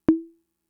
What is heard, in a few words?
Conga, Fricke MFB-522